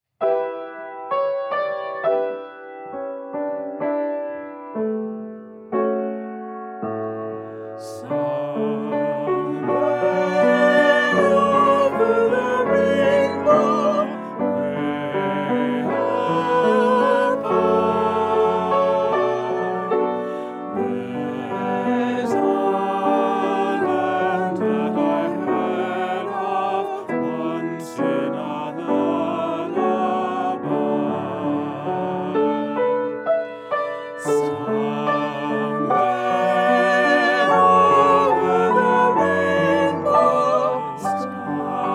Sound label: SAB Piano